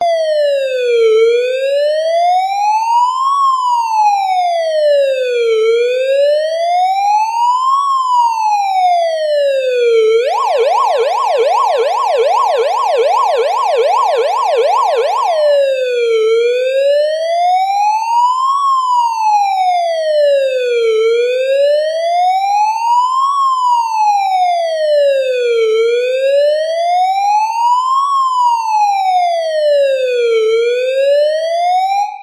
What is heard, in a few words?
emergency police siren isolated clean; zapsplat